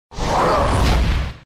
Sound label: skill snake